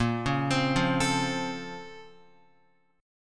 A sharp Minor Major 9th, down, Guitar; A#mM9 arpeggiated; JGuitar

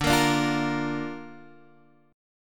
D Minor Sharp 5th D Guitar Standard; x,5,8,7,6,6; Dm#5 strummed

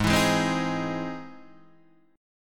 E Augmented Major 7th

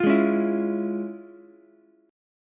F Minor Major 7th Sharp 5th Ukulele; FmM7#5 strummed; JGuitar

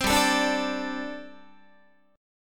G Suspended 2nd Suspended 4th, Guitar

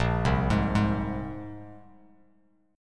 Bb 7th Suspended 2nd Bb, Bass Standard; Bb7sus2; arpeggiated; 6,3,6,1; JGuitar

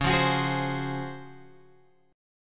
C sharp Major 11th, chord; C#M11 Chord {9 8 X 11 9 8} strummed

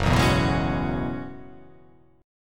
Gb 9th Suspended 4th Gb down, chord; Gb9sus4; strummed; JGuitar

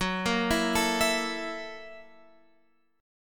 F sharp 7th Sharp 9th, Guitar, Open F; F#7#9 arpeggiated

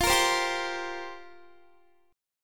F Suspended 2nd Suspended 4th F down Guitar

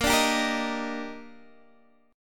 D sharp Major 7th; chordshape; x,13,12,12,11,11

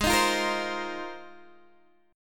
G sharp 6th Add 9th G sharp Guitar DADGAD

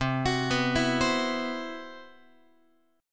C Major 7th Flat 5th C, Guitar; CM7b5 arpeggiated

